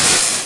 creeper say; mob; minecraft; PixelPerfectionCE

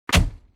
chest closed; minecraft; PixelPerfectionCE

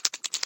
step, spider; mob; minecraft; PixelPerfectionCE

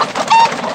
chicken hurt; mob; minecraft; PixelPerfectionCE

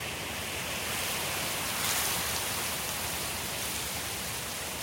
rain, ambient weather

